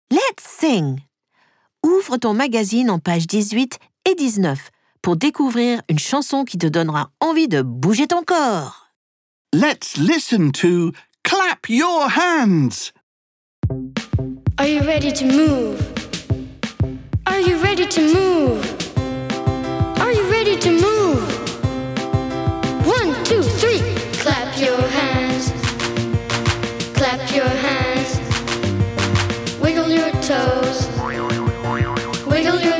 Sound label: Let's sing Clap your hands; I Love English for Kids